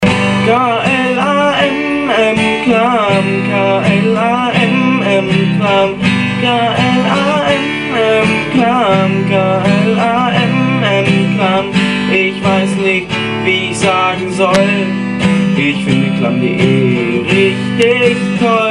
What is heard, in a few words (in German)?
klammsong gitarre